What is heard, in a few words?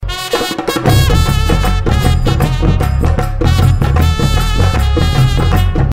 brass riff